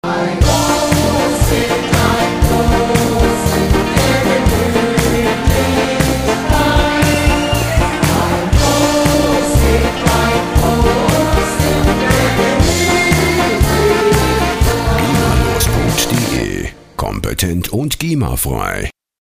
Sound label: bierzelt tusch